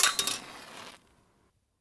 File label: Lantern On